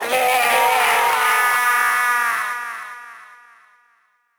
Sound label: Death; Wizard